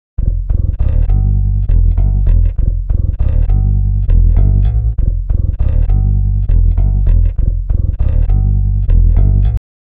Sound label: pitchshift bass; ik sampletank